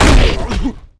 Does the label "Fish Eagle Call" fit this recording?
No